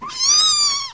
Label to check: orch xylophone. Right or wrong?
Wrong